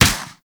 Pursuit Space Ship Turret Fire; Warframe; Grineer; Turret B

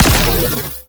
Ice Weapon Beam Fire; Warframe; Corpus; Corpus Railjack; Spec Ops Ice Moa

